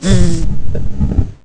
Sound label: BUG DIE; STATION